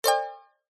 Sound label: multimedia alert notification glassy high pitched short positive